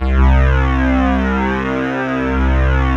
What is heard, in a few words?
FLANGE C3; Greytsounds - Scott Peers Sound Engineering